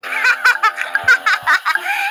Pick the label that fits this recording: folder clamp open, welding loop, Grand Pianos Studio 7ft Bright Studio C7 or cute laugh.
cute laugh